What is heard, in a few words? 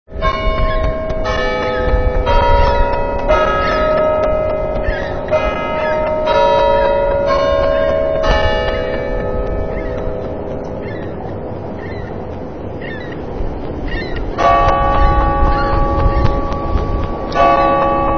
bells